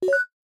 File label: Cyber